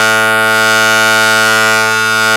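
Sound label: synth guitar synth; Best Service Gigapack 1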